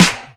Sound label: drum hit clap; osu!